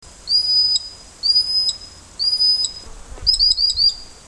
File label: T hypol worrying call